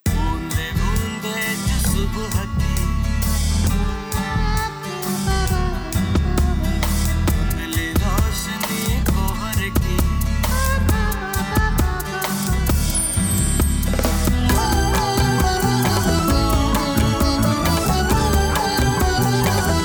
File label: A Birds Single Song